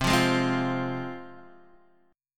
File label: C Suspended 4th Sharp 5th, C, down, Guitar, Half Step Down